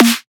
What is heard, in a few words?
Hard Snare; Antidote Advent